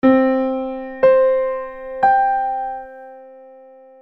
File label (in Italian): progressione delle prime tre armoniche